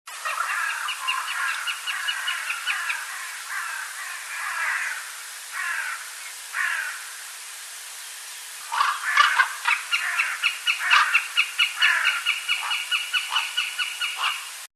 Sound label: Accipiter gentilis